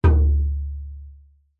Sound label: musical drum tom hit strike single mid low